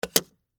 Packaging Tape, Industry, Packaging, Tape, Gun, Set, Down; Offices; BlastWave